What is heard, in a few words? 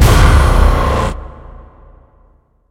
Music